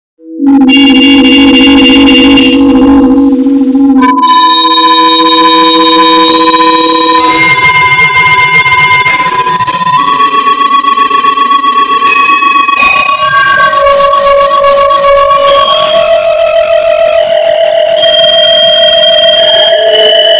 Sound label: noise